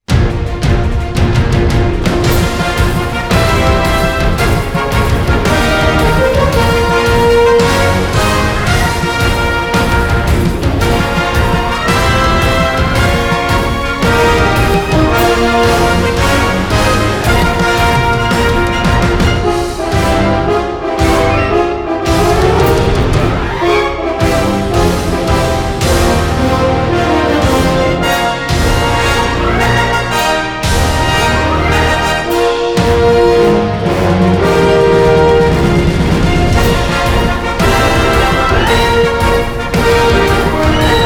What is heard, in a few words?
Fighting Spirit; Star Wars Hunters